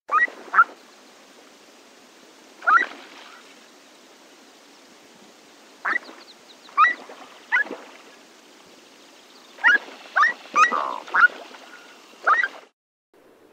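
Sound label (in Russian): gagara, loon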